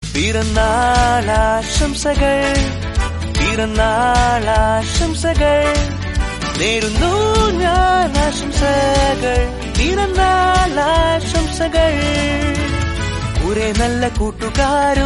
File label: happy birthday song malayalam